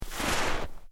Footsteps Boots Snow Deep Step; Footstep Foley; Sound Effects:Human

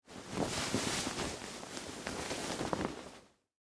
Dress Shirt Take Off, Foley, Clothes; Dressing; Human; BlastWave

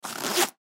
Fire Suit, Fire Suit Zip Up; Emergency; Emergency Vehicles

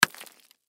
Ice Block Chisel Break Single Hit; Weather; Ice Sound Effects; Part of Ice (Sound Effects:Weather); Blastwave

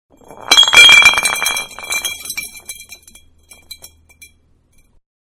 Large Bottle Fall On Concrete; Bottle Large-Fall Over On Concrete; Glass; Materials; Manhattan Production Music

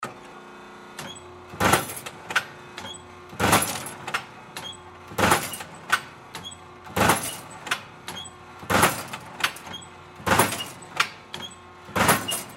Metal Shear On Run Off Pound Cut; Metal Shear On Run Off Pound Cut Thick Pieces; Blastwave

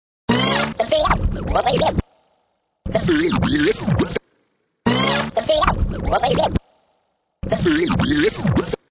Scratch 100, Professional killer vinyl scratch; Professional killer vinyl scratch perfect for sampling, mixing, music production, timed to 100 beats per minute.; Dj

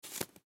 Face Mask, Face Mask Ear Loop Pick Up Counter; Hospital Sound Effects; Surgeon Surgery; Emergency

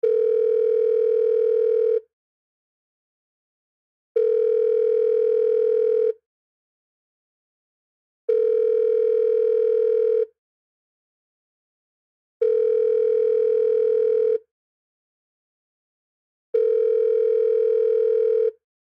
Telephone Speakerphone Ring Loop; Office; Blastwave

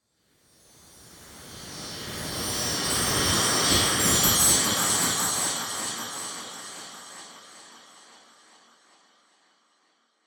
SWELL CHIMES, Swell Chimes Delay Reverb Airy; Production Element Imaging Element Accent Transition

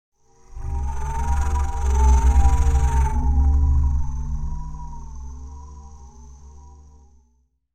Spy Scout Droid, robot sound effect; science fiction sound effect; Andrew Fleming

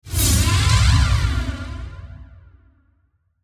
Whoosh Dark Flange; Blastwave

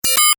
Tech beeps, Beeps, High Tech; Stuart Duffield

